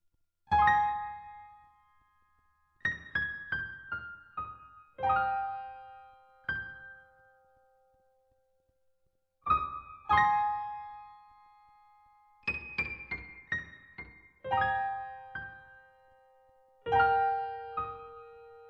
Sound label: Piano; ragnarok; streamside